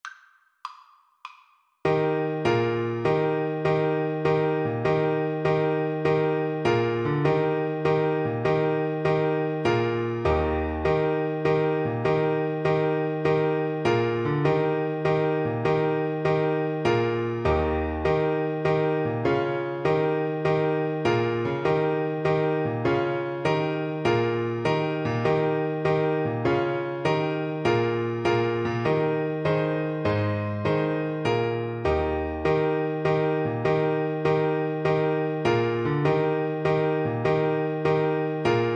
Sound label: jig, Clarinet